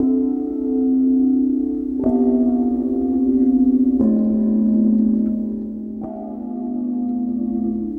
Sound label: Gong Down; Sonic Foundry (Sony Creative Software) - Friendly Fire; Gongs